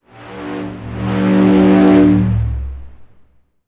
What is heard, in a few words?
sound design effect air pipe pressure